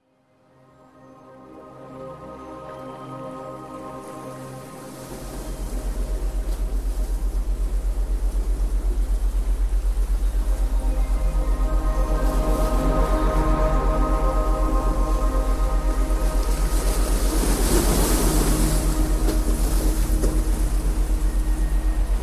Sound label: sea